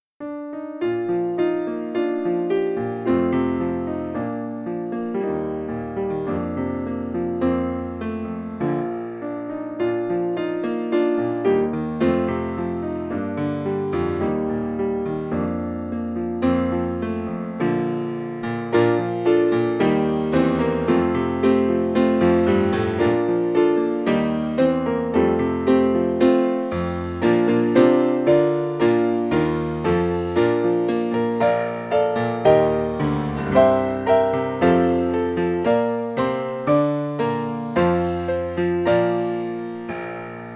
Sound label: shrine piano